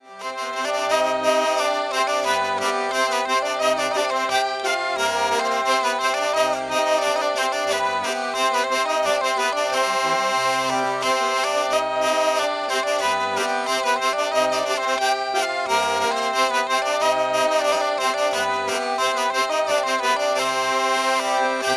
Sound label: scottish accord; noel